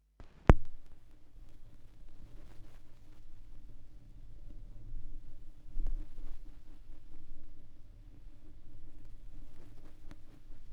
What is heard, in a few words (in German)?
schallplattengeraeusche, Vinyl